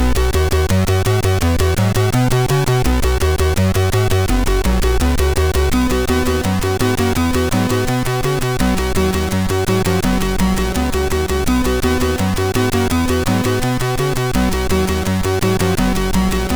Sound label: Rock MOS6581R2; Mermaid